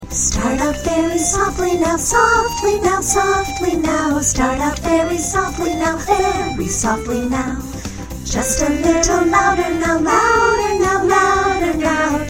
Crescendo, Start out very softly

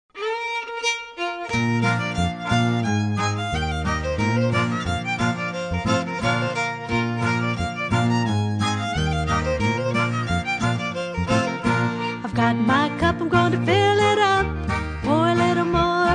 uh oh

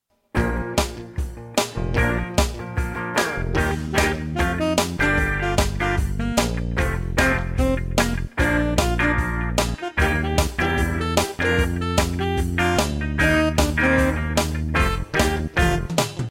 instr; musical plays for K-9 classrooms

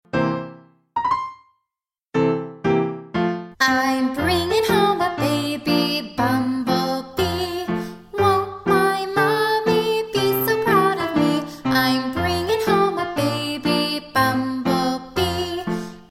vocal; Baby Bumblebee

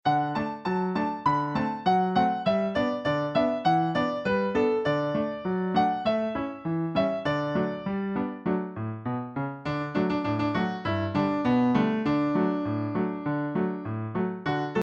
frog went a courtin instr

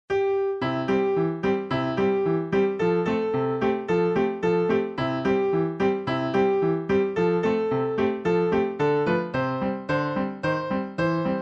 rise and shine instr

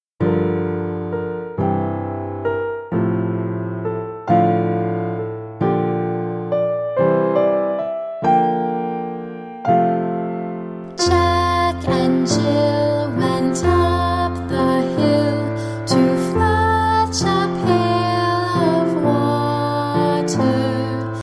jack and jill vocal, Children's Music; jack and jill song

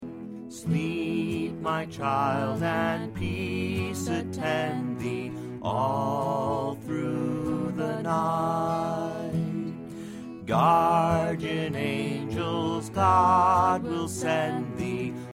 vocal, lullaby; All Through the Night; lullabies

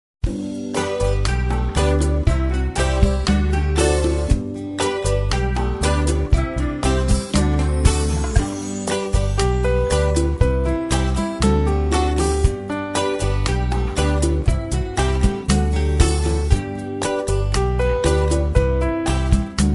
instrumental

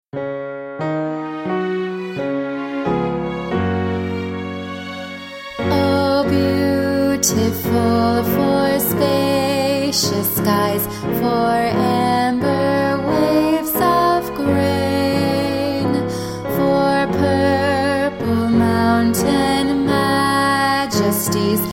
vocal; America the Beautiful; folk

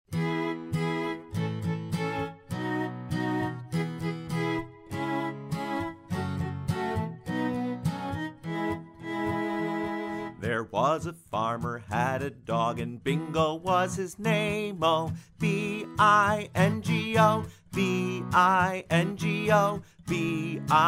vocal; Bingo

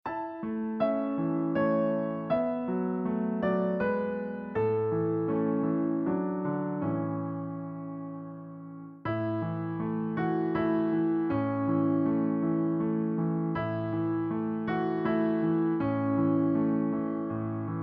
silent night instr

